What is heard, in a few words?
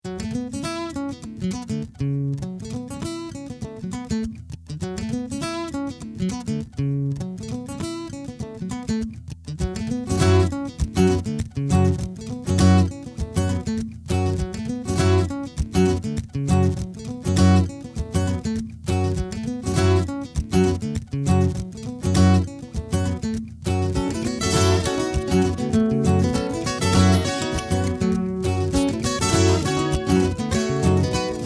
permute 16th